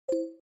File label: chat message sound effect; roblox